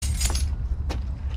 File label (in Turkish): anahtar dusme sesi